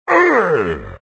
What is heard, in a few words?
thwomp; Super Mario 63